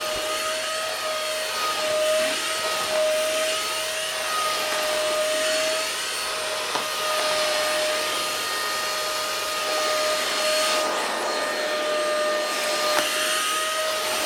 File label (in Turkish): elektrik supurgesi ev temizligi makine hava sesi; elektrikli supurge sesi